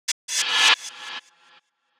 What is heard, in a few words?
Cym Reverse Fx; Kryptic Samples; Number One